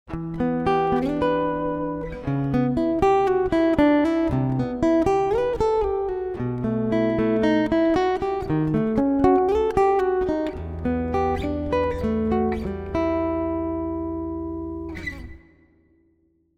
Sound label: Boulder Creek Acoustic